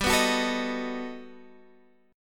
G Augmented Major 9th G down Guitar DADGAD

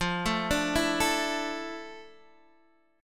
A Suspended 4th, F

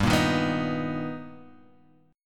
B Augmented Major 7th G, chord

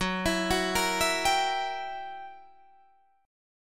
Fsharp 7th Flat 9th down Guitar Open D

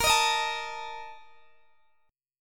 A sharp Major 7th Suspended 4th, Guitar DADGAD

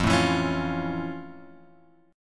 F Augmented Major 7th F; F+M7 Chord {1 0 2 X 2 1} strummed

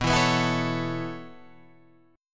B 9th Suspended 4th B down; B9sus4; strummed

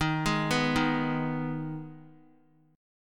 D sharp Suspended 4th Sharp 5th; arpeggiated; JGuitar

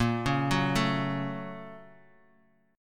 Bb Minor Major 7th Sharp 5th; BbmM7#5; arpeggiated; 3,1,2,0; JGuitar

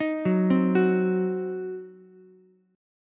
C Diminished C, Ukulele; arpeggiated; JGuitar

